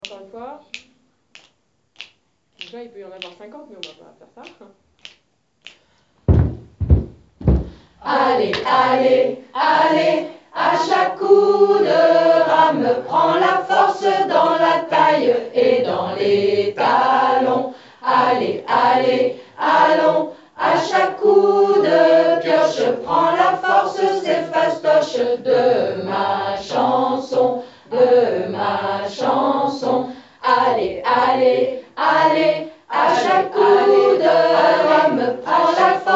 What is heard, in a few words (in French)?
Allez Allons unison canon